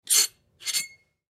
Teapot Remove Lid, Teapot Remove Lid Tea; Tea Kettle; Dishware; Household

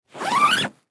Lacrosse Equipment; Lacrosse Equipment Bag Zip Fast; Sports

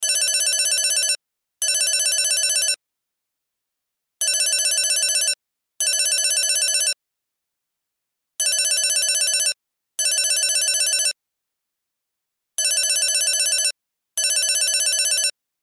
Cell Ringtone

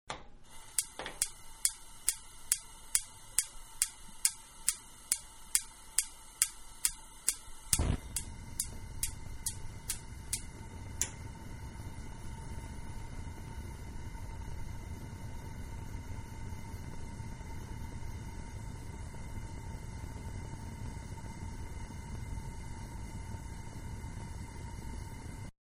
Gas Stove, Self Start; Kitchen (Sound Effects:Household); Manhattan Production Music

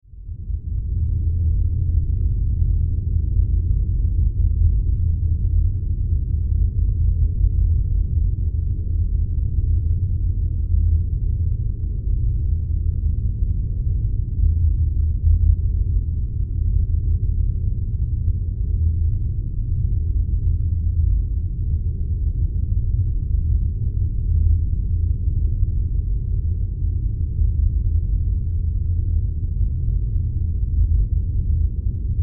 Science Fiction Spaceship Interior Hum; Spacecrafts

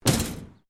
Metal Plate Impact; Material components to create more complex sounds; Materials

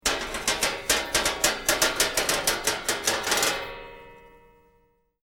Metal Drag Scrap Clanking

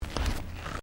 Footsteps Carpet Step

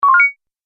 Multimedia Correct, beep

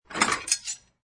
Knife

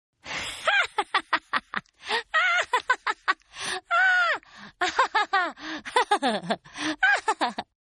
Laugh Female; Evil Laughs; Laugh Evil Wet; Laughter; Voice; Partners In Rhyme

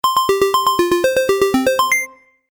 Game App Stage Complete, Video Game Sound Effects

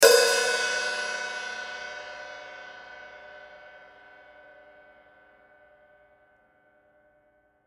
Drum Hi-hat; Musical; Blastwave

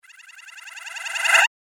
Fast Flashy Whoosh

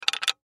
Door Lock Shake; Sound Effects:Objects

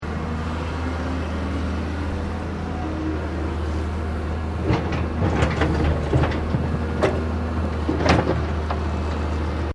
Construction earthmover scooping rocks; Various construction machinery; SoundEffects Foundation2

